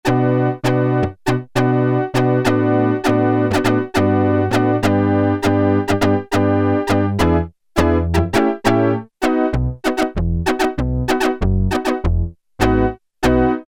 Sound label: Barking Organ; Sequential Circuits Prophet-5 (Ver.3); Factory Patch; File 2 Programs